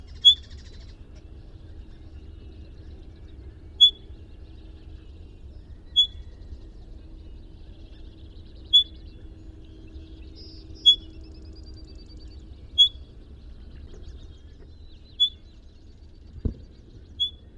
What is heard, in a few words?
Red winged Blackbird Agelaius phoeniceus gubernator